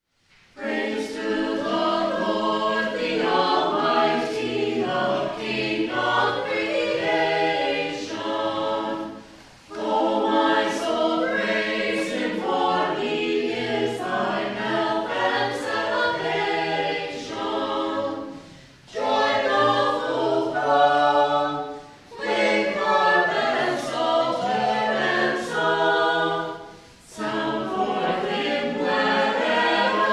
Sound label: Choir Praise to the Lord; Thanksgiving